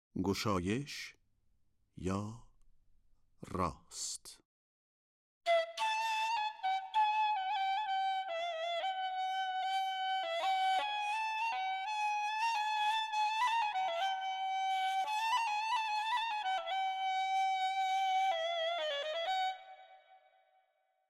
Goshayesh Bayat e Tork Radif e Ney; Avaz-e Bayat-e Tork

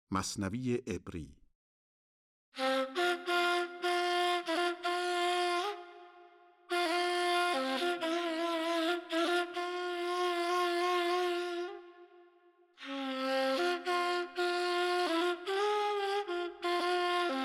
Masnavi e Ebri Isfahan Radif e Ney; Avaz-e Isfahan

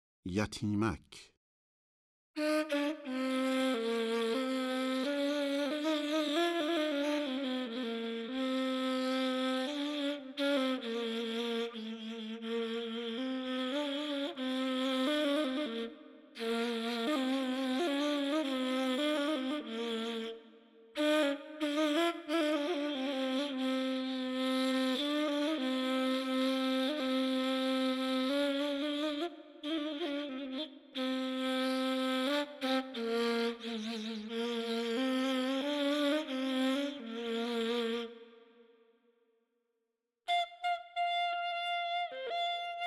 Yatimak Mahur, Ney; Radif-e Ney; Dastgah Mahur

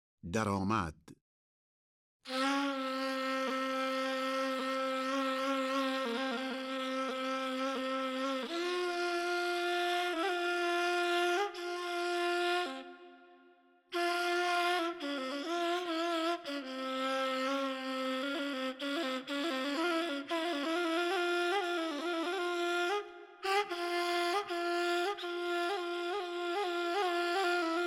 Daramad Isfahan Radif-e Ney; Avaz-e Isfahan

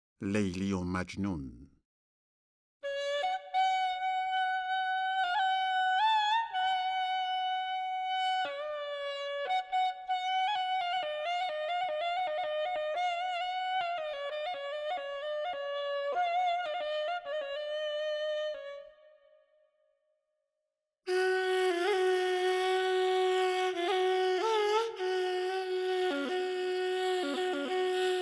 Homayun Ney; Dastgah Homayun; Leili o Majnun

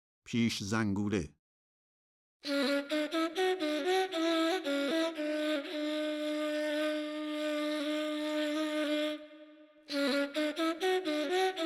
Pish Zanguleh Segah, Ney; Radif-e Ney; Dastgah Segah

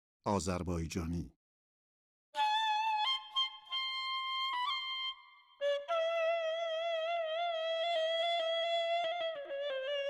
Azarbayjani Chahargah Radif-e Ney; Dastgah Chahargah

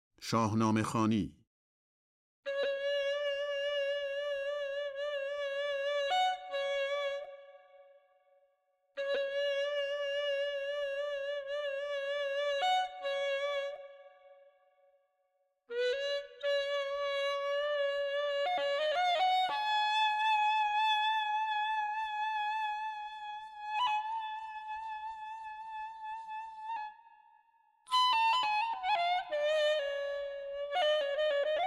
Shahnameh khani Homayun Radif-e Ney; Dastgah Homayun

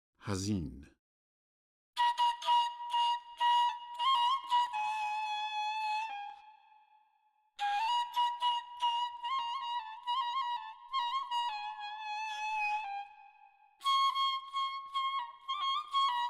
Hazin Isfahan Ney; Avaz-e Isfahan

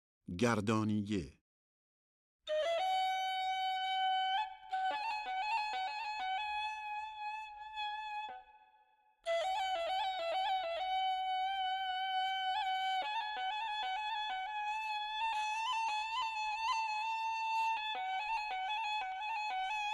Gardaniyeh Nava, Ney; Radif-e Ney; Dastgah Nava

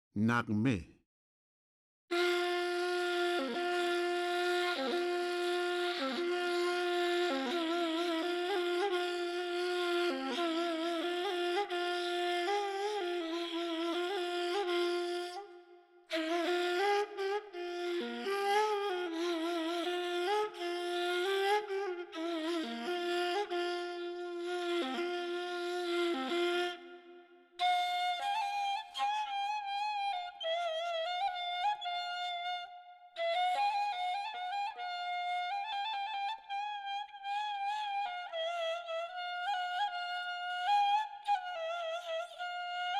Naghmeh Isfahan Radif-e Ney; Avaz-e Isfahan

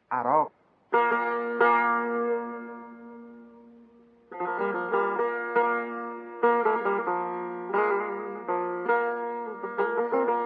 Aragh Mahur, Dastgâh Mahur

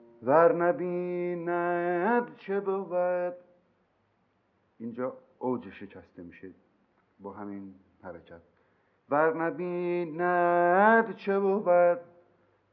Odj-e Shekasteh Mahur, Dastgâh Mahur

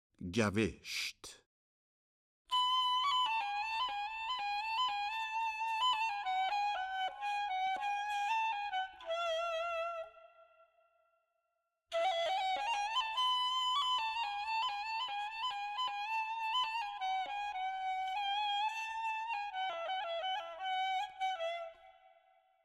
Gavesht Nava, Ney; Radif-e Ney; Dastgah Nava

